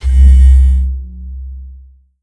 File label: Light On; moul